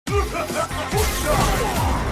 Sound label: Foot Dive